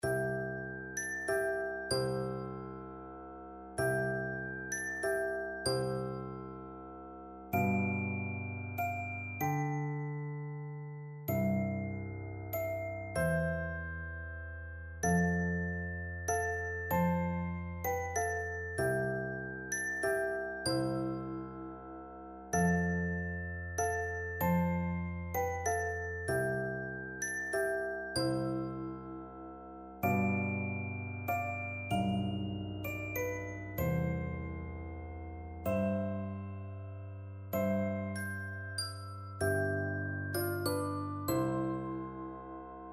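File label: glockenspiel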